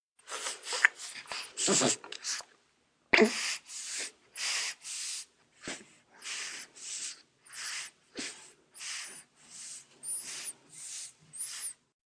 Baby Feeding, Baby feeding, breast feeding, breathing, gulp, grunt, human voice, kid, child; infant; Eating Sound Effects